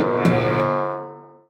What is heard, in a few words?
Hemogen Amplifier Working Stop; rimworld